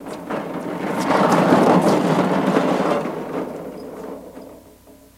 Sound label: Cart Pass By; carts